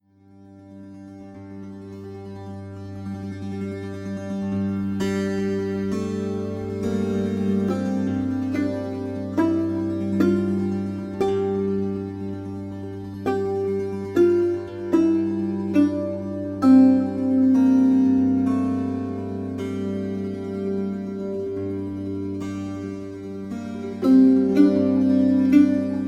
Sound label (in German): Monochord Dorisch Phrygisch Raga Todi